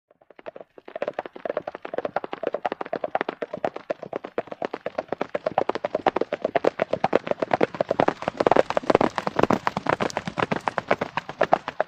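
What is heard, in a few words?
By 2 Horses Canter Up Past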